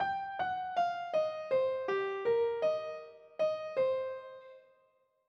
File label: blues scale